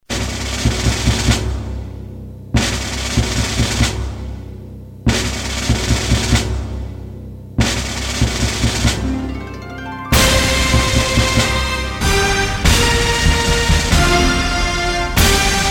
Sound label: harp